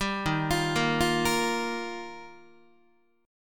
D sharp Major Add 9th, Guitar; arpeggiated; D#add9; 10,11,8,8,9,9; JGuitar

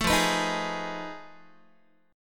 F 7th Sharp 9th Flat 5th F down Guitar; F7#9b5 strummed; 12,13,14,13,12,x

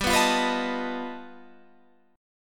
G Augmented 9th, Guitar, Standard; G+9 strummed; JGuitar

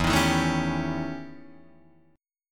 E Minor Major 13th E Guitar DADGAD

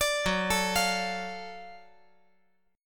F sharp Minor Major 7th Sharp 5th F sharp down

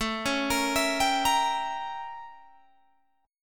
A 7th Flat 9th A, Guitar Open G; 19,18,20,21,20,20

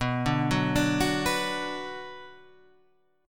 B-Minor; Bm Chord {7 5 4 7 7 7} arpeggiated

